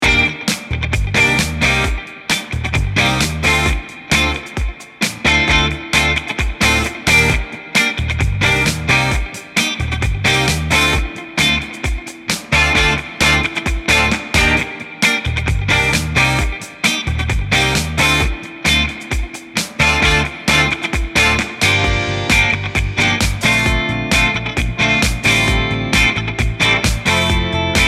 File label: Feel Good Guitar Pop Rock